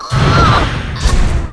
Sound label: die; Monster; 레이드; 강남쌍괴 소괴